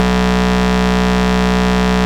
Root B, Ultimate Bass; Vengeance Samples

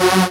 Synths F#; Vengeance Samples

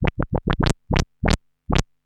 Arp D Line, ARP Odyssey; musicradar-synth-samples

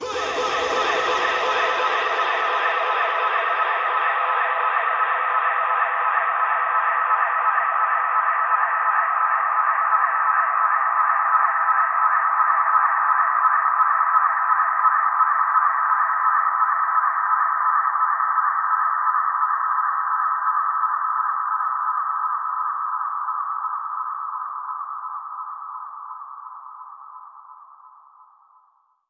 Reverb Athmospheres, FX Vocals; Vengeance Samples

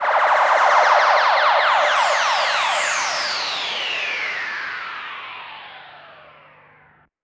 Downlifter

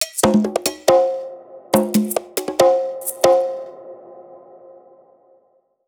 tribal loop, Dubstep; Dubstep Sample Pack

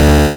Arcade Riffs

